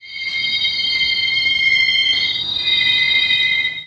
Groan

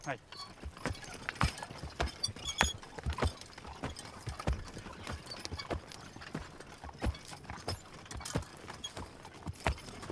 Horse Weeding Plowing

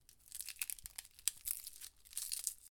Crunching Onion Peel

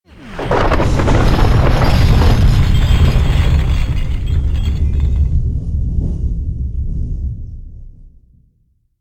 Futuristing Collapse Explosion

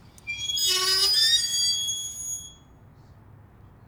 Metal Gate Opening

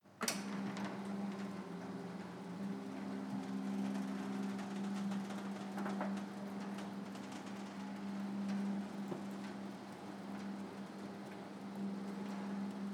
Roller Shutterdoor

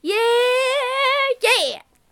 Yeeeeeaaaahh Yeah

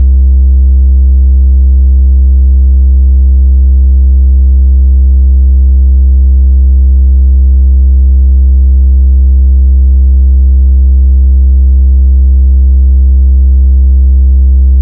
More Than Sine Mono

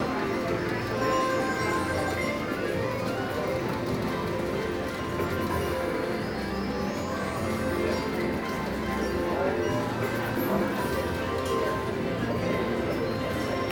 Slot Machines Win

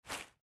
Small Smooth Objects In A Bag

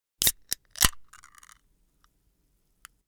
Opening Can With Fizz Soda Beer Pop

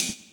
Closed Hi Hat

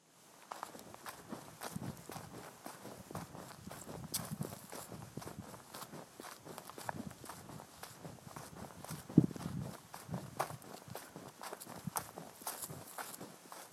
Walking In Dirt On Side Of Country Road

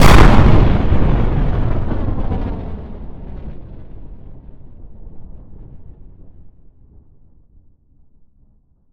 Explosion bomb blast ambient

